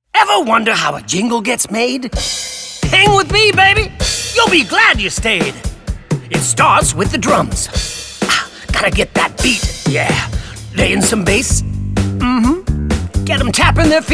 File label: Jingle